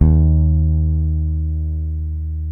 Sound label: BASS D#2, Electric Basses; PlatinumPhattCD2; E-MU Producer Series Vol. 8 – Platinum Phatt